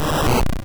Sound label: Ice Punch; Pokemon; Gen 1; attack moves; RBY